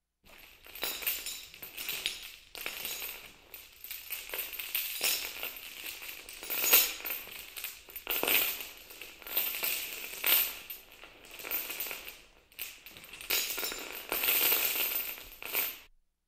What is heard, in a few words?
Chains Rattling